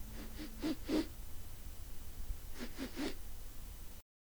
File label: sniff